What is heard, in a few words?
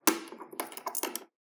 coin wooden table; soundscrate